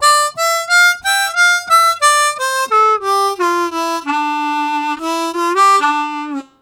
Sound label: Slow Walk, Harmonica Riffs; Zero G